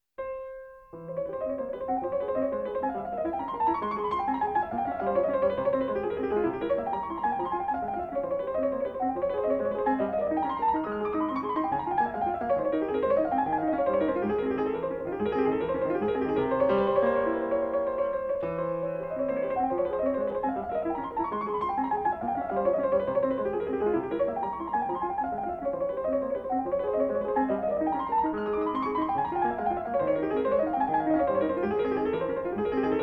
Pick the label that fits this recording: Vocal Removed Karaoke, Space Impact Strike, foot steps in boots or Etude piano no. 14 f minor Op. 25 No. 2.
Etude piano no. 14 f minor Op. 25 No. 2